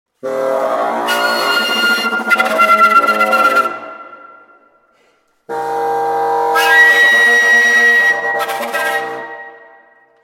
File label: Flute Bassoon; Veismane